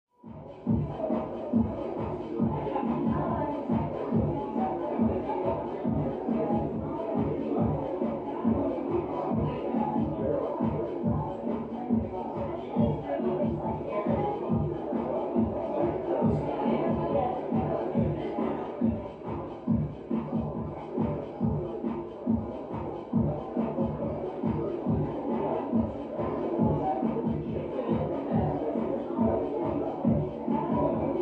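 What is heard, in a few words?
Party Busy Adult Walla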